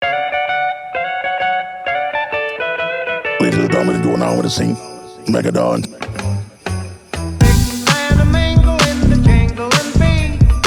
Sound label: Jingle Mingle Instrumental; xmas beats